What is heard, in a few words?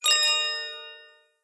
Chime; blue archive